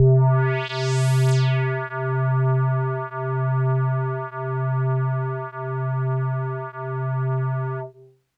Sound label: Hologram C2; Kawaii